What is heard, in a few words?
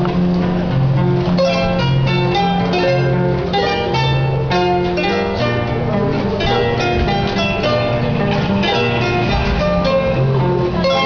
harp